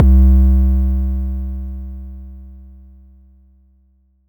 HUM; ModelCycles

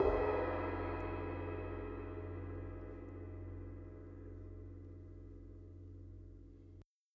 Cymbal, Orchestral; Sonic Foundry (Sony Creative Software); Orchestral VOL-2; Modern 11

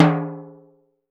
TIMBALE L, LATIN percussion; Voice Crystal - Steve Reid Definitive Percussion; LATIN SET

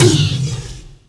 TOM WILD; USB Soundscan vol.33; AKAI; Disjointed Textures; DRUMATIO

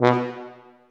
TUBA, Solo Brass, Tuba stacc

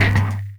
Spitter Hit, Esoterik Beatz; Spitter Kit

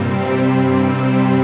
PAD, Drum & Bass, 160 BPM; Ueberschall; Drum & Bass Resonance

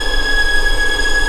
ANGELIC G#5

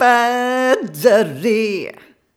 Rhythmic A

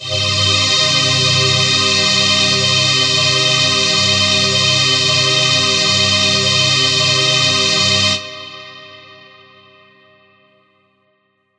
Wide Atmos, Strings & Pads; Zero-G Sound Sense Grime Scene; 90 s Sample CDs

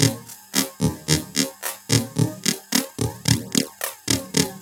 LOOP, TECHNO; TECHNO-BOX; ELEC-LOOPS

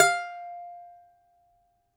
DOMRA; E-MU Producer Series Vol. 7 – Old World Instruments

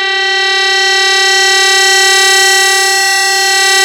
2600 E5, ARP 2600; Hollywood Edge - Giorgio Moroder Rare Synthesizer Collection

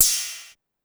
Ride 808 Down Fast, Clavia DDrum-AT, Pitch Env; Pitch Env Various

